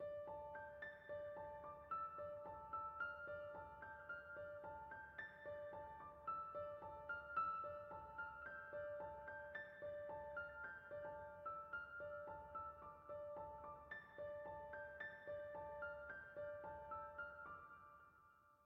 Piano; Frozen Flame

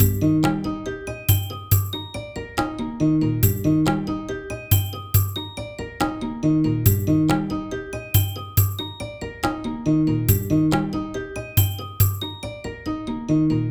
Mood Music

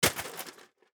plastic break; teardown ps5 windows xbox series xs gamerip 2022

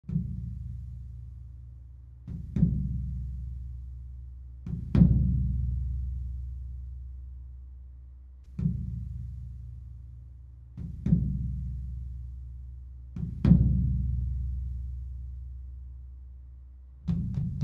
Longambient; Elite Warriors Vietnam

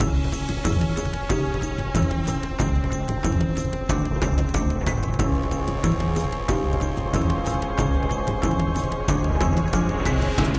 Action; James Cameron's Avatar The Game

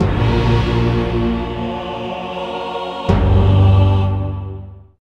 Boss Defeated (Stinger)

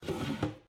Close; Loda

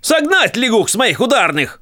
Twitch Drums; gamerip; The Princess and the Frog; Wii, Windows

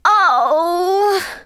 Emote Mistake; Twitch Skijump

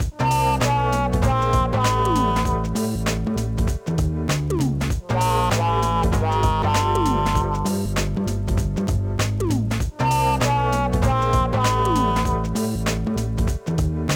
Jingle Lose

